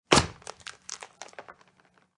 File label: destroy; Ball Physic Game